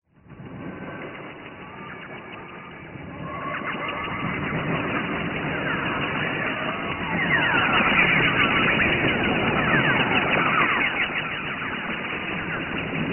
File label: sound design sci fi retro vintage style sweep lo fi; scott lawlor